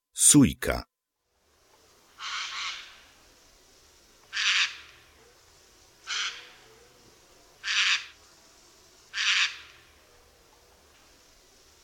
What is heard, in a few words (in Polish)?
SOJKA